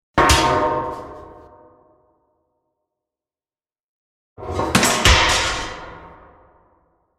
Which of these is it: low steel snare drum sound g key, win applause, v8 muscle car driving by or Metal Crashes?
Metal Crashes